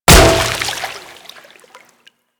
Staff Impact Water

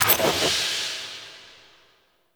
Crafting UI Open; Zariman

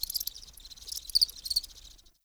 Prairie Day Close Cricket; Ambience

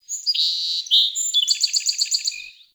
Forest Open Glade Bird Med, Day; Ambience

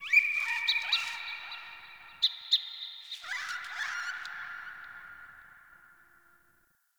Trapped Animal Chatter Distant; Ambience; Ode Sky Fortress; Fortress Tunnel

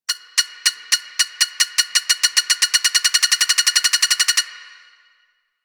Grenade Countdown; Corpus

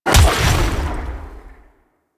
Melee Jump Short Slam Impact; Weapons; Whooshes; Warframe

